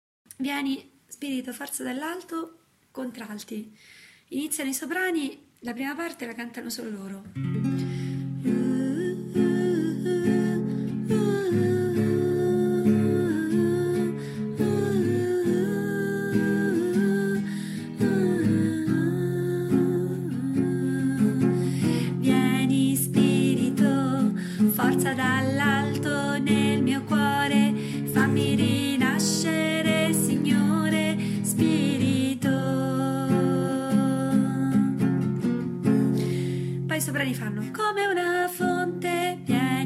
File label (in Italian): Contralti; Vieni Spirito Forza Dall Alto